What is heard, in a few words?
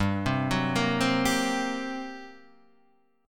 G Minor Major 7th Double Flat 5th, G, Guitar, DADGAD